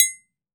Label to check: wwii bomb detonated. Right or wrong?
Wrong